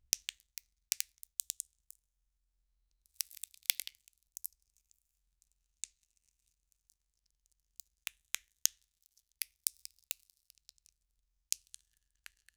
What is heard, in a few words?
sharp crack of the candy